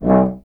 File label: Tuba, Solo Instruments; Miroslav Vitous - Solo Instruments VOL-2